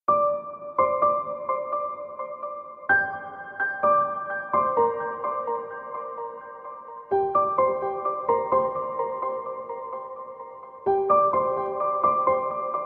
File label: piano